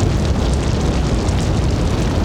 mouseover fire; gamble; DoubleTigersWD; wazdan

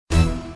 mystery short; Criss Cross 81; wazdan

